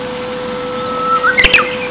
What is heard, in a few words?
uguisu; haruna